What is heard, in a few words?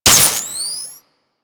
Plasma Grenade Flesh Hit; Warframe; Weapons; Hit Effects